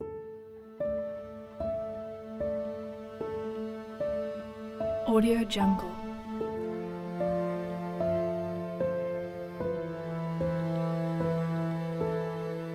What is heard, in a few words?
mystic adventure